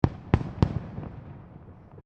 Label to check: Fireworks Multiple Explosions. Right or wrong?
Right